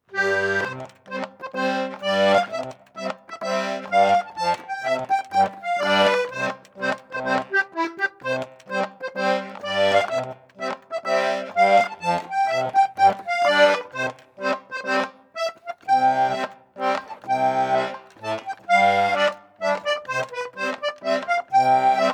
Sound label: Danish Masquerade